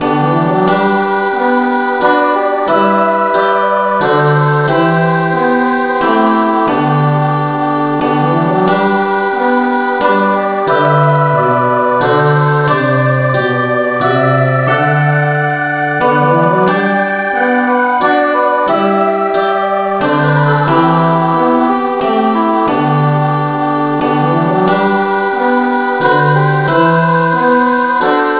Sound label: no vocal